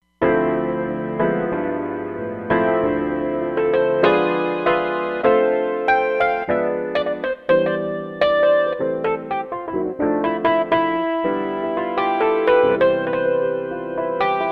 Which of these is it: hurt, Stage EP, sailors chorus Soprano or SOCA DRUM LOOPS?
Stage EP